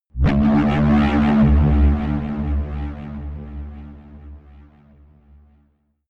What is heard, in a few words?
electronic growl